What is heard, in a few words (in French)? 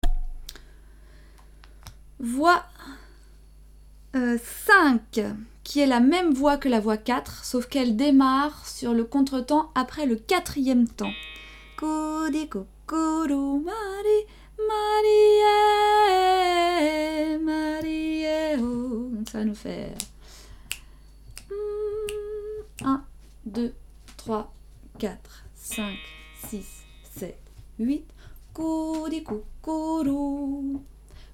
voix, chant d inspiration pygmées